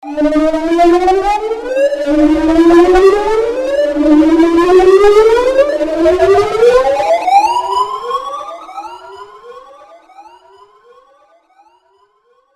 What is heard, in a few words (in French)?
hypervitesse bruitage